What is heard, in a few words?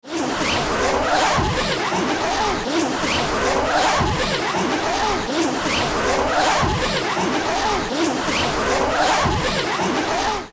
wire down